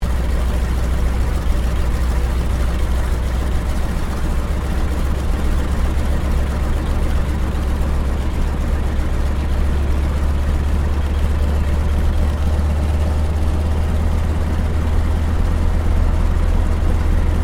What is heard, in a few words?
AIRCRAFT CAC WIRRAWAY IDLE INTERIOR; CA-16 Wirraway; Airplanes; Vehicles; Airplane Sound Effects